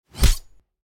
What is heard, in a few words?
Knife Stabbing; soundscrate